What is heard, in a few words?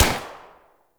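Assault Rifle Fire Shot; Warframe; Weapons; Tenno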